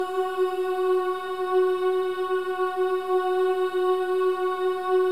Boys; Spectrasonics; Symphony of Voices

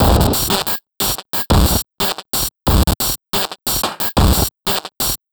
Noise Beat; Drums & Drones

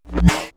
Flup, perc fx; X-Static Goldmine

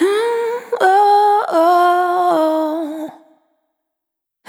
Spin, Single Voice Melodic Loops

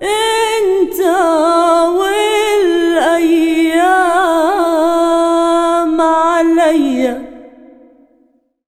SINGER, Arabian Traditions; USB Soundscan vol.43; AKAI

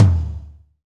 TOM TOM, Roland

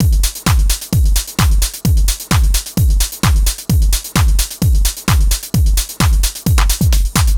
Solid Loop 130, Loungin House; Equipped Music

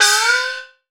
Asian Gong; Cymbals

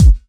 Kick, Kickdrum; Equipped Music; Loungin House

